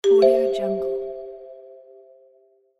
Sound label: new sms text message